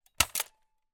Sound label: toy nerf gun strongarm fire